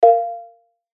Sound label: chime bright percussive; Multimedia; Chimes; Blastwave